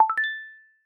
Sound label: Text Message Acknowledgement Heart; macOS system sounds